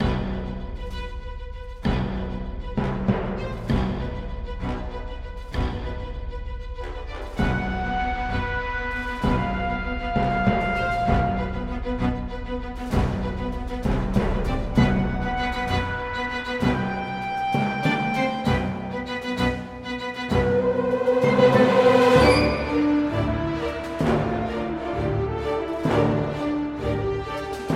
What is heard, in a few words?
sting; The Sims 3; windows gamerip 2009